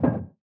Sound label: push drop